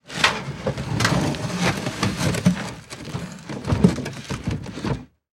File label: Household Gate Wooden Gate Scrape